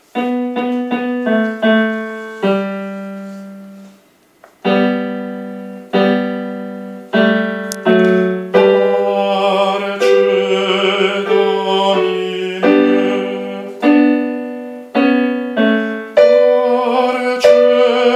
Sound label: Parce Domine Contralti; repertorio polifonico